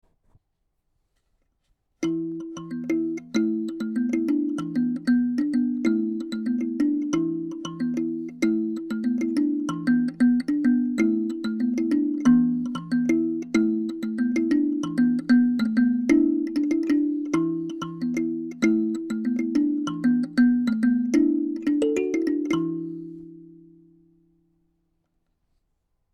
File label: Kalimba